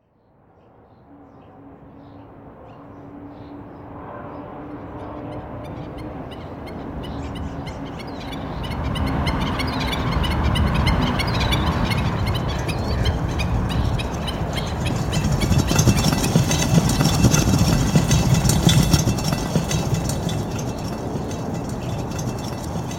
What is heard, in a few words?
flow obstruction soundscape; angela